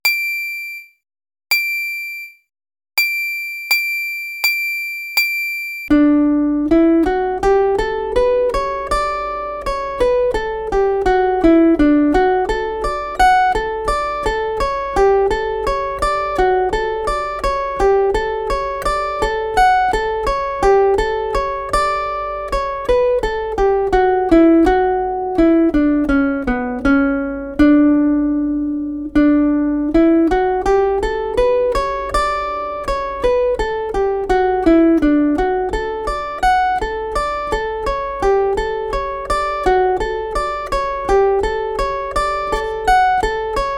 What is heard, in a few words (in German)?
Tonleiter auf D, Zither; Playalong; Neue Zitherschule